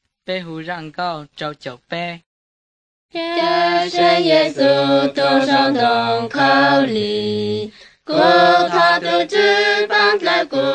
songs hmong blue; bible

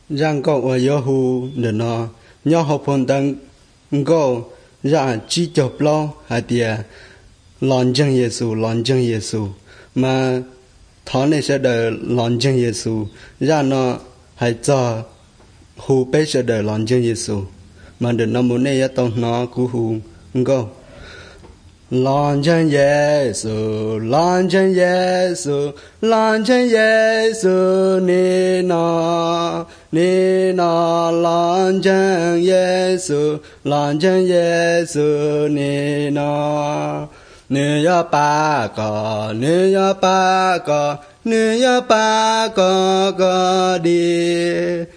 songs hmong white

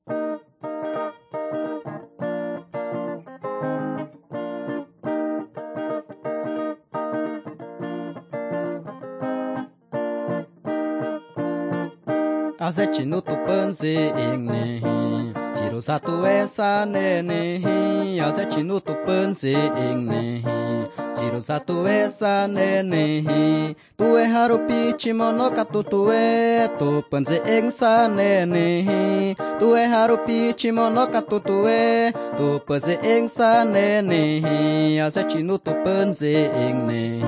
singing to god

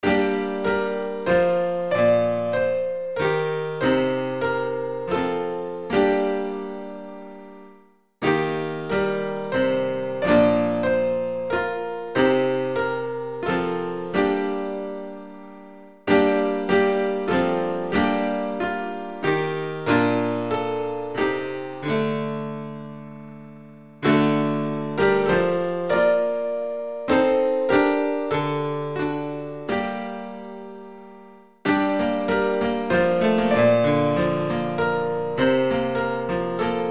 instrumental hymns; o sons and daughters let us sing

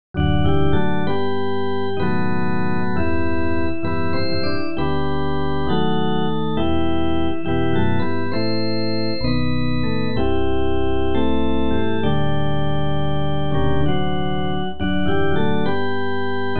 lift every voice and sing, instrumental hymns

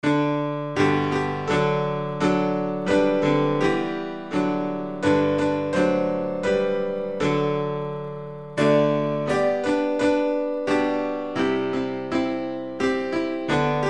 instrumental hymns; o for a thousand tongues to sing

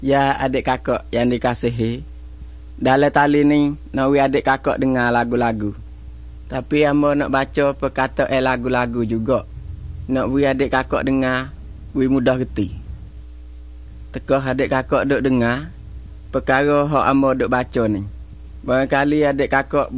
songs malay pattani

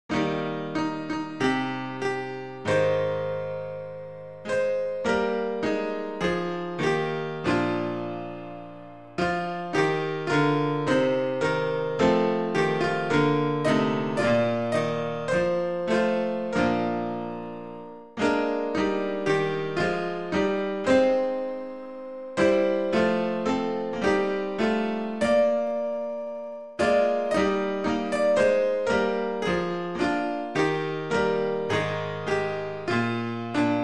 instrumental hymns; crown him with many crowns

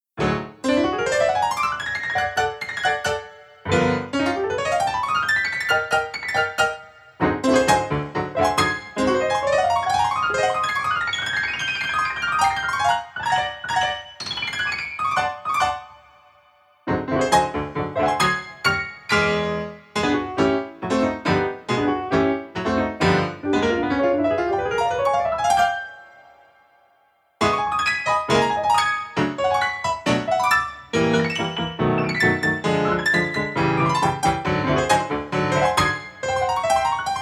piano trials; piano hands for war

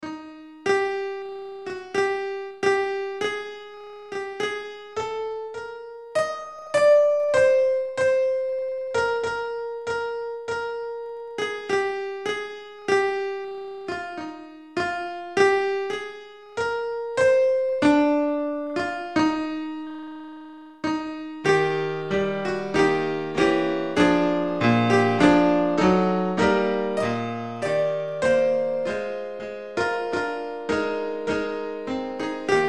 instrumental hymns; i heard the bells on christmas morn

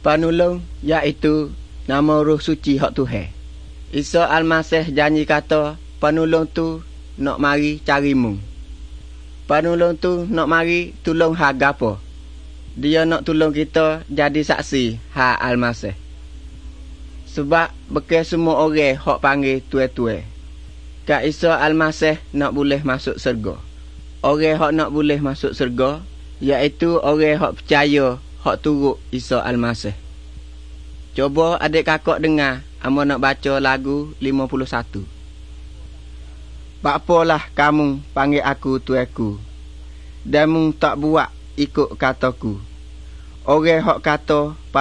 songs malay pattani

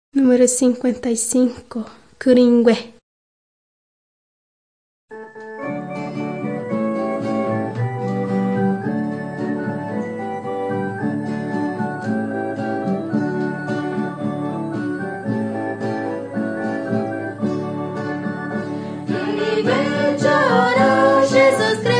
songs guarani mbya; bible

